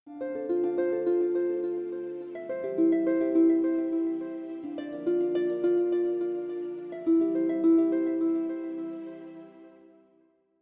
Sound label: Harp Echo; Infinix; HOT 40i; alarms